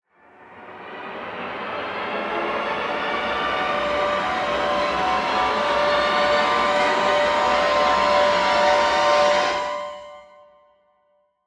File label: Dramatic Long Rise